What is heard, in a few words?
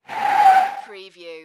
Car or motorcycle brake, tyre screech